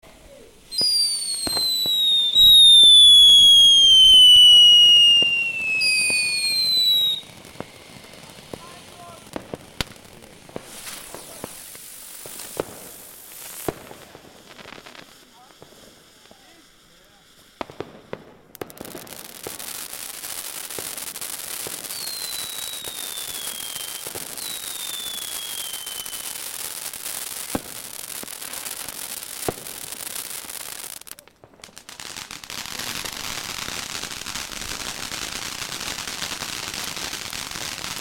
silvester fireworks close to two neighbor parties on street